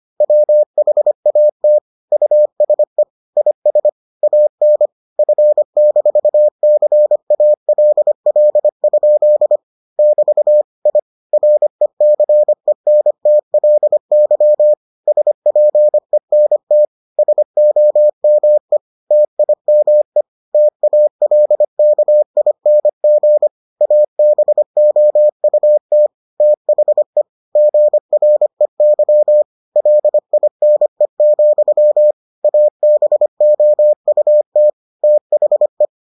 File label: morse